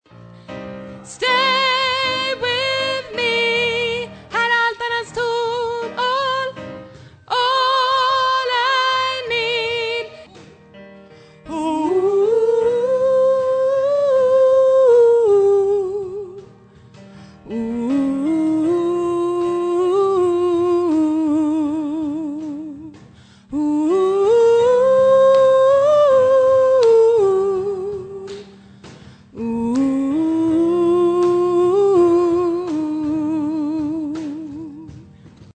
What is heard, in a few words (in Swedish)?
alt, stammor